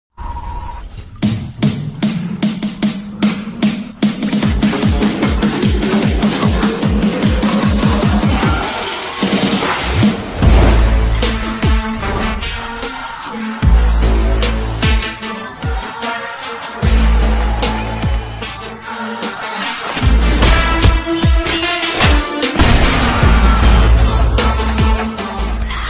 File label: trailer bgm ringtone; cobra; chiyaan vikram